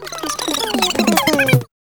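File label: tape wind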